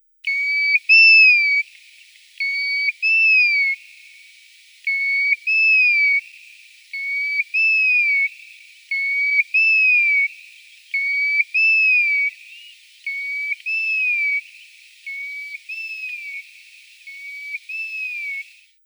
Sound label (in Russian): rzhanka zolotistaia, zvuk rzhanki